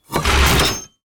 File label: damage; World of Tanks